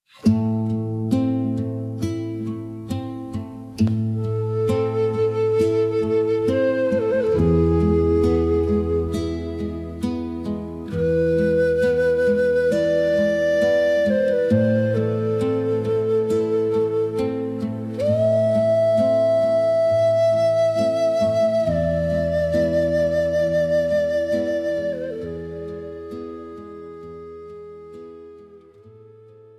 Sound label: shofar calls